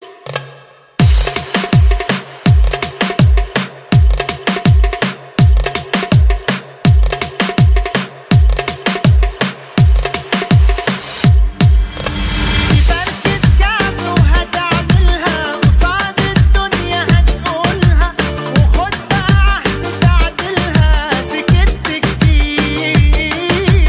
Darbuka

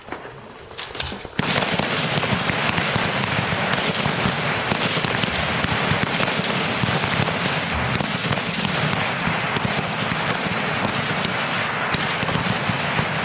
Marine Firefight

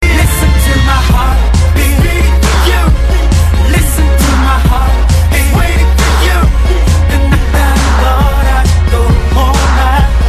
Heartbeat

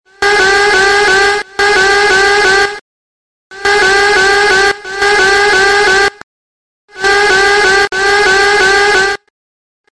Extreme Clock Alarm

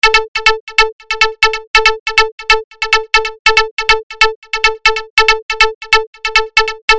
Roland Arp

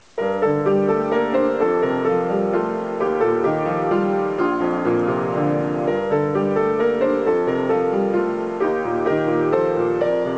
Piano

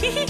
hehe; Changbin

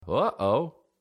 Uh Oh

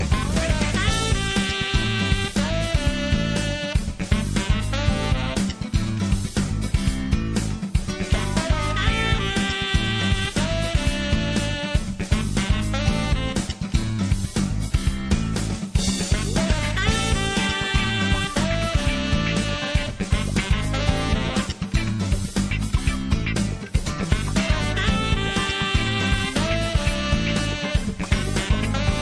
Sax Passion

